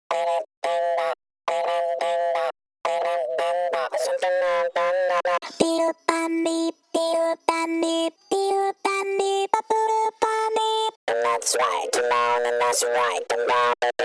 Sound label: voices